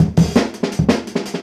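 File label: Jungle Breaks, 168 bpm; Original Jungle Breaks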